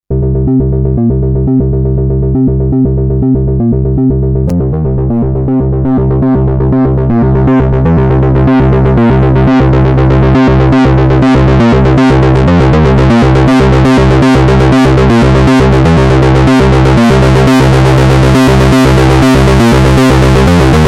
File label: Distortion; MBS 100